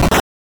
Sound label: hit, attack sound; カタナ１